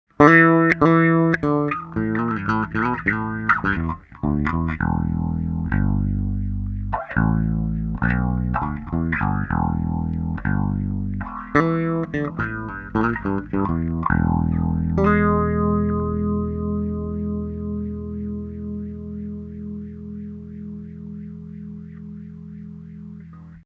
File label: Bass normal Cutoff Reso; Cutoff 12 h; Reso 3 h